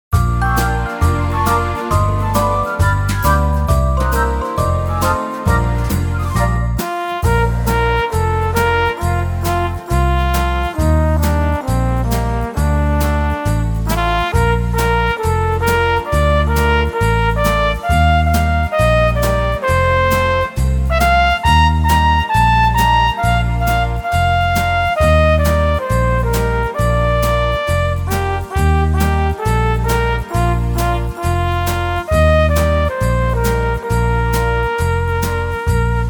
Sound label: Christmas Song